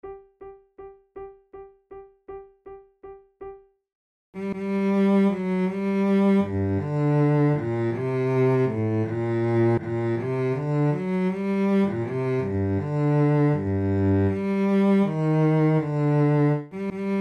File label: trio sonata corrente, flute tunes; corelli